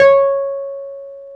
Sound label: PNO T.Wurly C# 6; Keyboards of The 60's and 70's